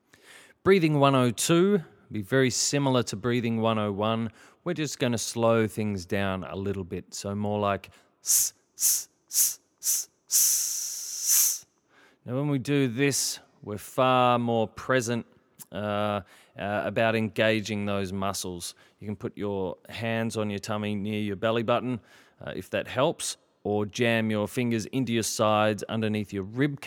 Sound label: breathing, singing